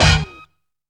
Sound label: LARGE STAB; Zero-G; Funky Elements